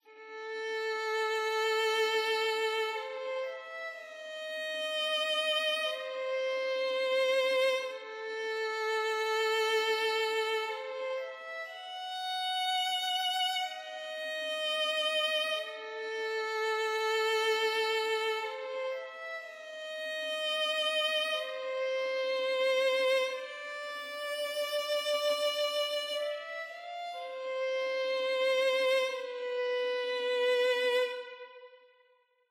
Violin; tintin reporter cigars of the pharaoh

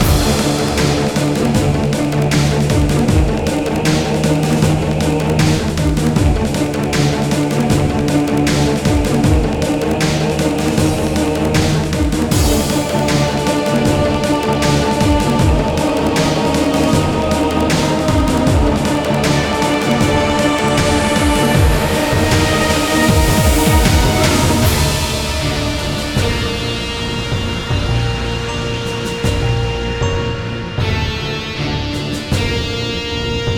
music chief; Castle Storm

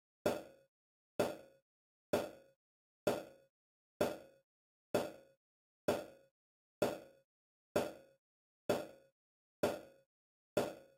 SNARE

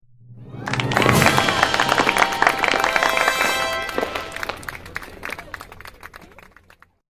Level complete; Octo Curse; PS5 Switch

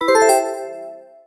Picture Reveal; the princess and the frog; Orleans; Draw Wallpaint

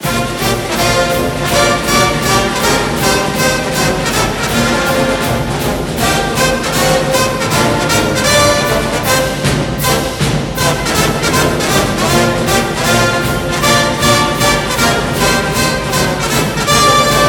Broadcast Fight Song

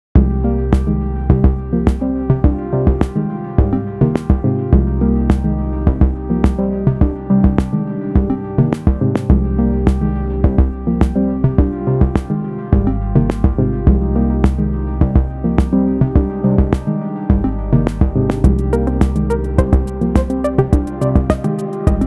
Beats Medium; beyondium; android ios

